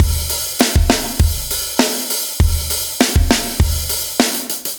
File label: Walker Drums, metal; Метал